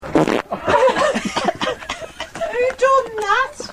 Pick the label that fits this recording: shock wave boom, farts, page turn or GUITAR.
farts